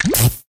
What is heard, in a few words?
Electro Eye Pop Out